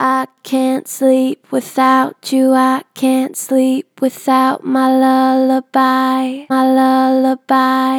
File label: Gen Vib I cant sleep without you, Vocals, Natural Vibrato Gentle; Harmor Vocals